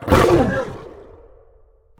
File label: manticore growl; smite